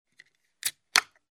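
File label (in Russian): спокойное открывание банки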